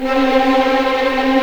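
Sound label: C4 TREM P VL, violins tremolo; East West; The Ultimate String Collection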